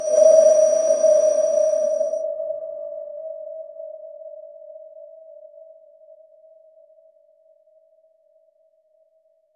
ui select opti, option; elden ring

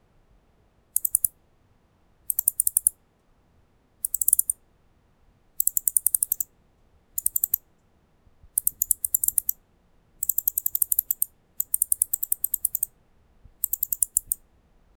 short rat attack

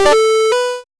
pixel game prompt sound

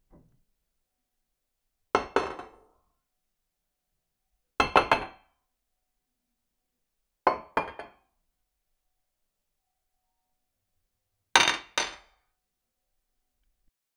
the sound of a plate, tableware

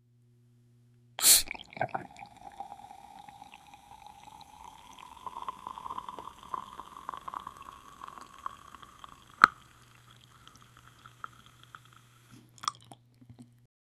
a person opens a can; food and drink

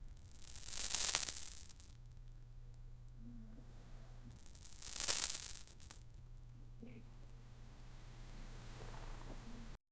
a gold ring falling in, velvet

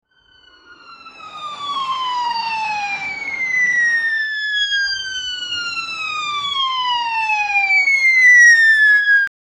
a speeding futuristic pol, siren

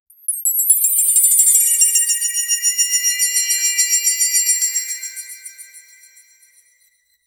crystalline digital chime; authoritative

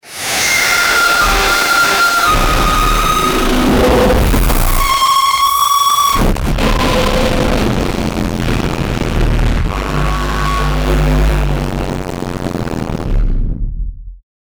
very evil laugther

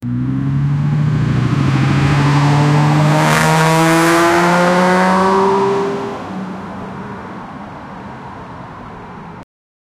there is a car chase, accelerating

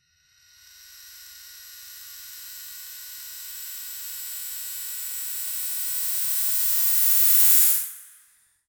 sci-fi

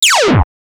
retro laser gun sound, scifi

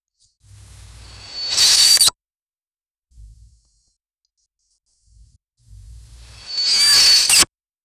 fast rope slide with hars

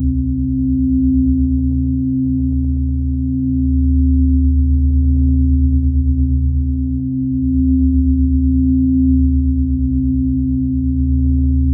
soothing ambient drone, meditative

